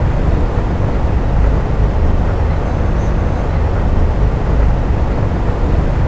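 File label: Table Raise loop; theed; XBOX; Star Wars Obi-Wan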